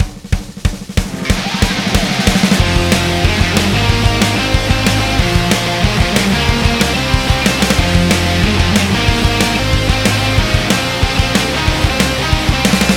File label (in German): B-Saite Song